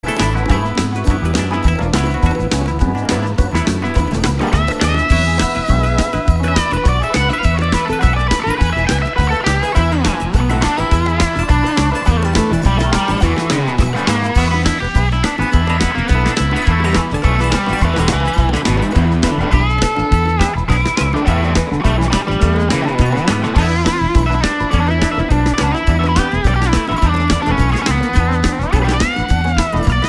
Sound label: Country Jam